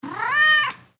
calf; animals

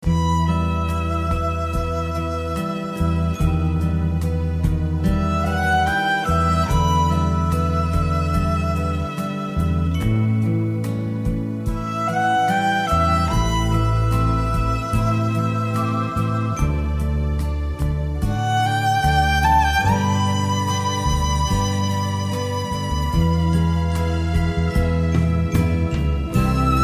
romantic violin; ringtones

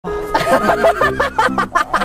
laughing

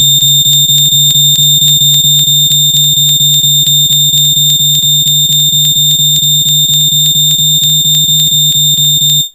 FIRE ALARM SOUND

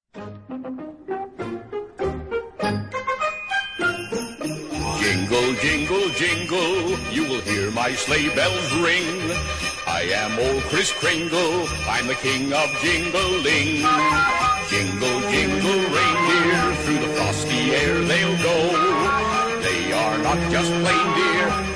jingle jingle jingle beginning

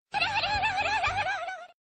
Laugh

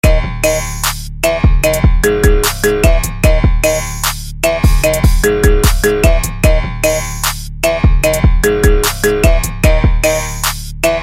iPhone

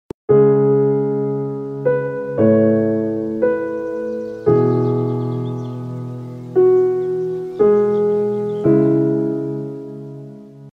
Sms morning spring

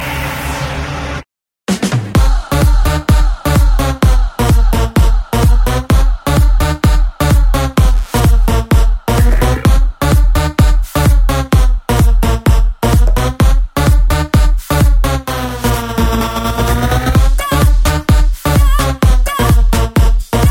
Electro

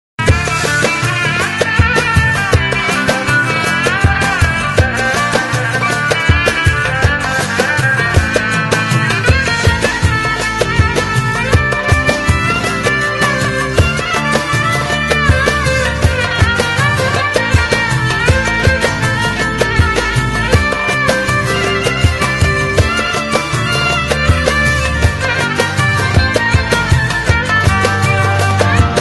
oriental dream; classical

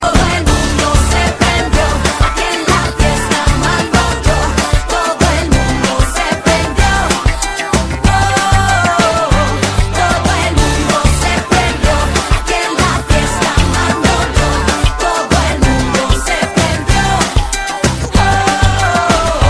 Acoustic

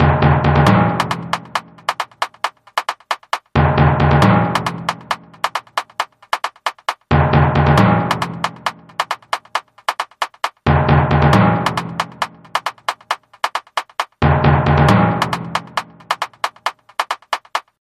Military Drums

